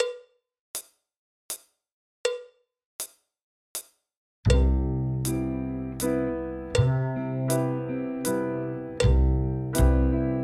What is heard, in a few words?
Drum Kit; MTB Exams; Pre Grade Intro; Three cornered hat